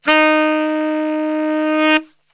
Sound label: D#2 SAX; Best Service; Brass Super Section